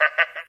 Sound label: laugh; lambert